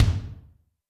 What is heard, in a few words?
kick, Zoom MRT-3B